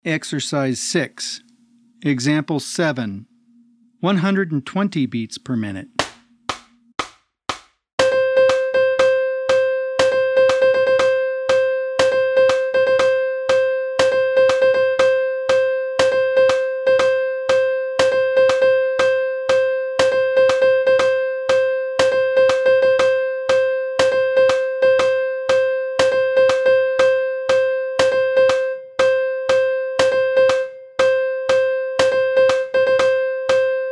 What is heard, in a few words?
120 BPM